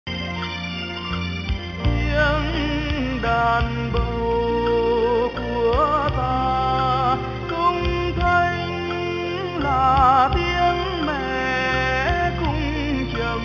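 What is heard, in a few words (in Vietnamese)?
tiếng đàn bầu trống tấn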